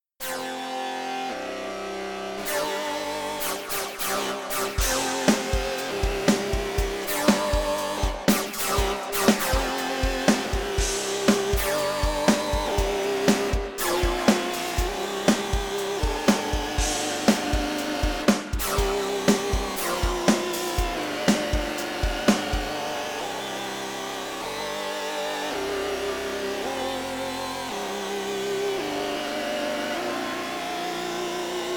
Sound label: RAW Synth; Rob Papen